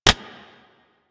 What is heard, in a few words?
Throwing Dagger Idle Catch; Warframe; Weapons